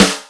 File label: RIM SHOT 1; Greytsounds; Scott Peers Sound Engineering